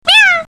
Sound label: Cat; Animal